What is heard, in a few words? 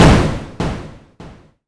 snare, Korg Electribe ER-1; Kit 14